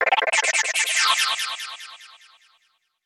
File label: TECHNO 140; Techno2